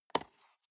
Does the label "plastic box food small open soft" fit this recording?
Yes